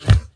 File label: ball